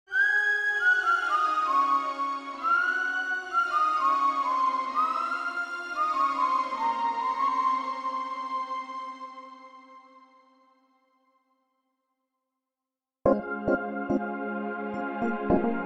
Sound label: brass minus